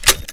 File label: Beatophone Start Up; tf2classic